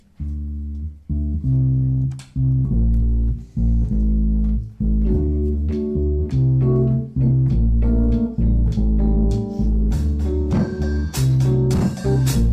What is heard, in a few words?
jazz